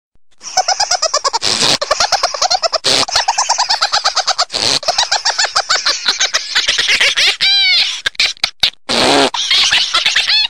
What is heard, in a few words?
Laughing Cat